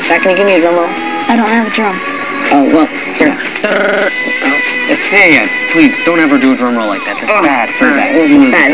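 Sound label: drum roll; Backstage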